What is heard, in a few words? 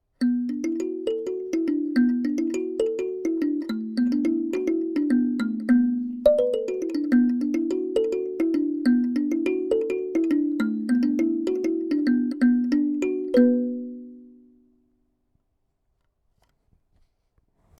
Kalimba